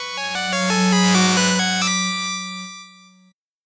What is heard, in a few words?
8bit jingle fast